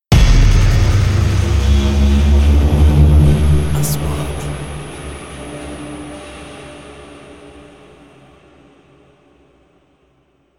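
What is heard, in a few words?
3D SFX Trailer; مؤثرات تشويقية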